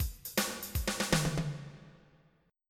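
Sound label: jazz break, drum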